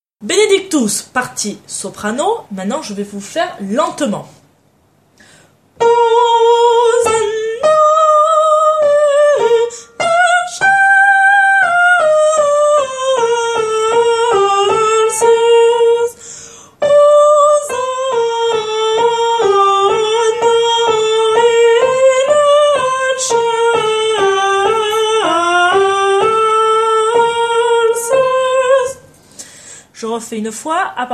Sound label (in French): Soprano Lent; Requiem; Benedictus; Mozart